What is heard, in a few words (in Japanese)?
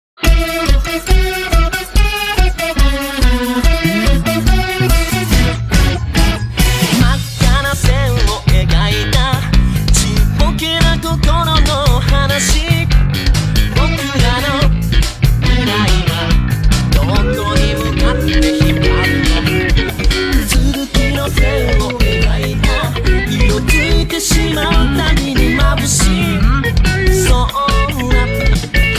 OP (Guitar Cover) ギターで弾いてみた